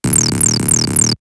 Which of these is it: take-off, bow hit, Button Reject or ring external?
take-off